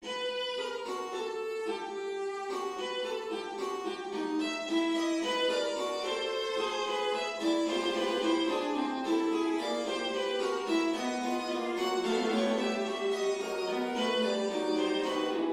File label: fughetta in b minor